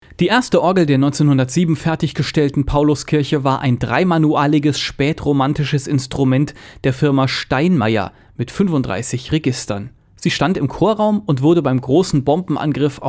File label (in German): Schuke Orgel; Schuke-Konzertorgel; Evangelische Pauluskirche Darmstadt